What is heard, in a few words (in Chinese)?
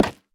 下界木活板门开关声